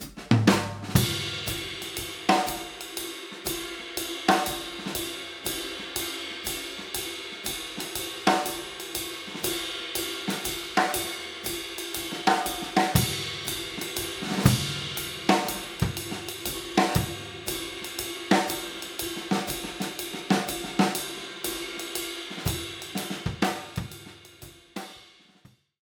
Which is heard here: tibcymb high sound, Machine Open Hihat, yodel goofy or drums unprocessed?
drums unprocessed